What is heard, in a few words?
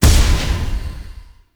Hand Projectile Die, Weapons; Warframe; Duviri